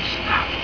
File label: snap; hannibal